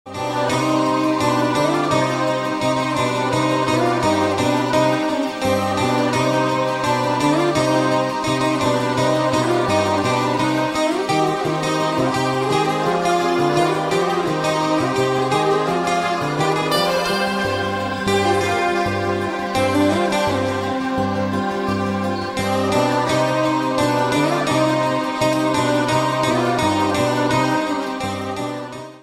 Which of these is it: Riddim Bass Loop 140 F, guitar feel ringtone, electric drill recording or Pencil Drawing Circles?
guitar feel ringtone